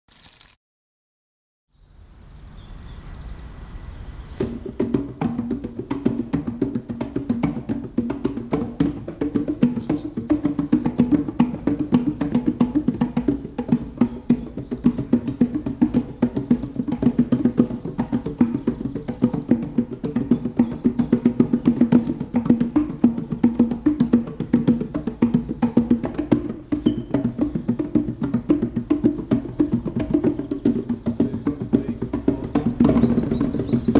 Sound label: percussions